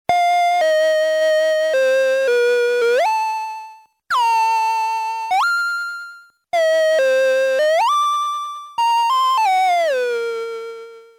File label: fantasy pitch up